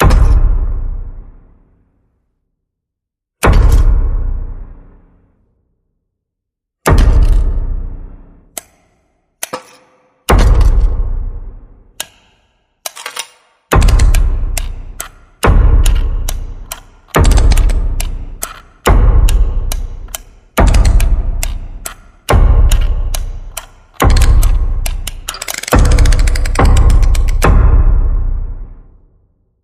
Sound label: Winding Dolls Doom Rhythm